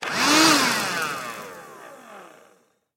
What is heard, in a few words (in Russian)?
zvuki ruchnogo pylesosa